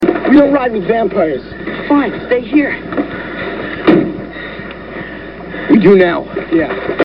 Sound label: ride